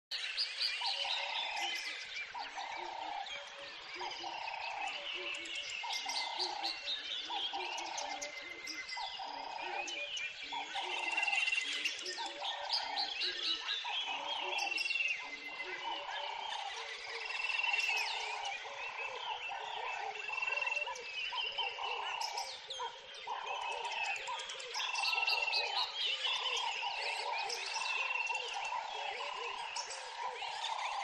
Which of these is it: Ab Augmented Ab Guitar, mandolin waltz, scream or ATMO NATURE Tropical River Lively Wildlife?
ATMO NATURE Tropical River Lively Wildlife